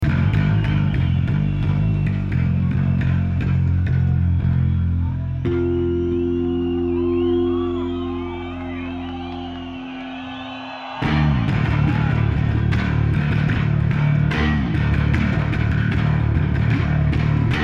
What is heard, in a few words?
Bass Solo